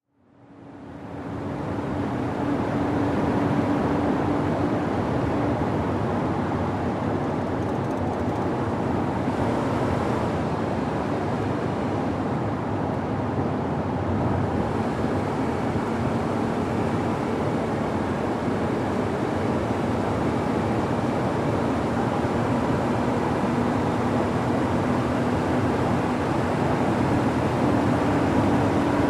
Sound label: SUV Drive 100 Mph